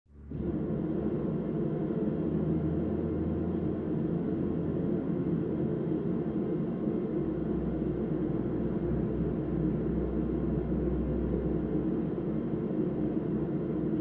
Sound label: Water; Flowing Through Pipes, Through Wall.